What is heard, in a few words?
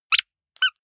squeaker squeeze with release hard